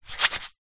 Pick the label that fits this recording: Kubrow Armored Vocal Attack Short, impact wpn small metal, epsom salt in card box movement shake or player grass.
epsom salt in card box movement shake